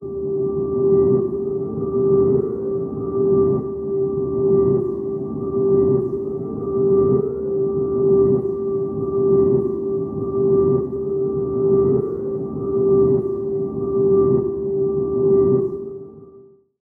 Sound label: Ambience